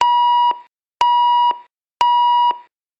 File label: alarm clock; Good Timing 1.50